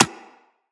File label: Bullet Impact Generic